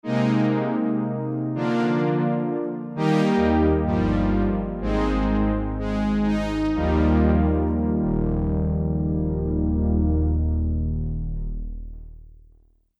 Saw Strings

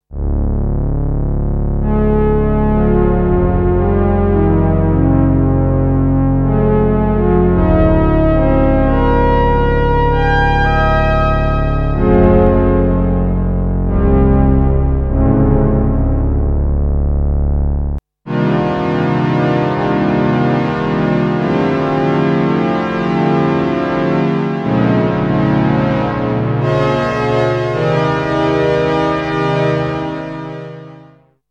String Pads

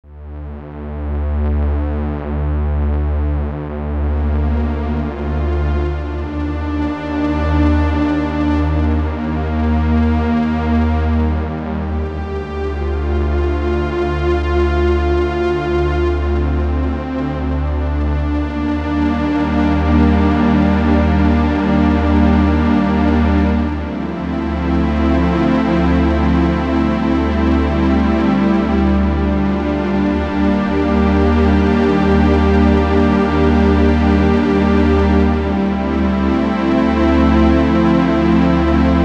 JP8 PWM Pad

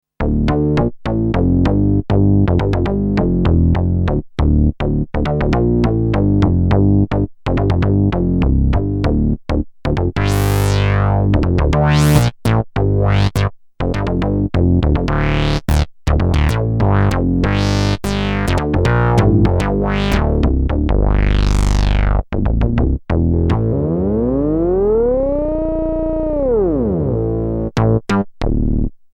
Bass Snappy; Ozzey Bass

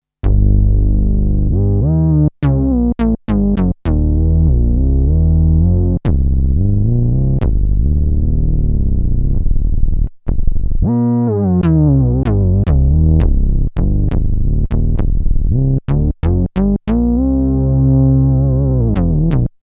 Moog Bass

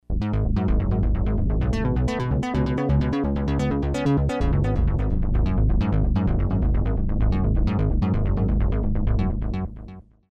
Syntronic Bass; Kris Rhen Presets

